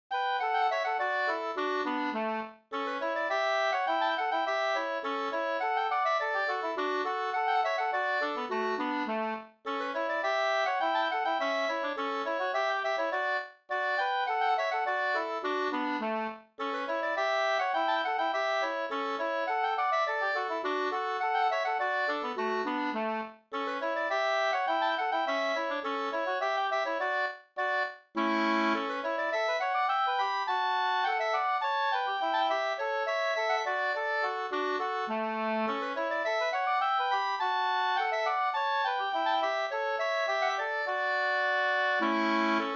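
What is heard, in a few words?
polska, two-part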